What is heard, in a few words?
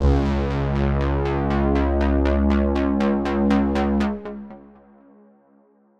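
analog C#3; analog server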